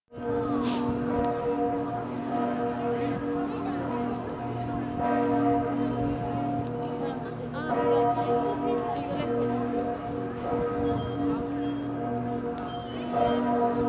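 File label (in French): Ambiance San Marco eglises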